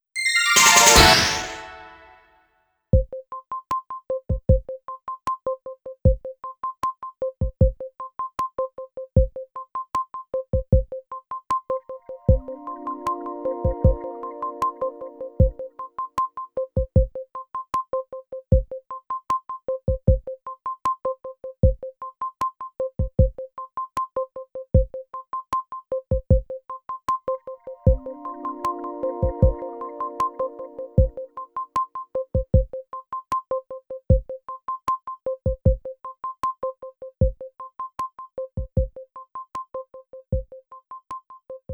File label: Question Fanfare ~ Pondering; Jogging +; Wii Fit Plus platinum soundtrack 2011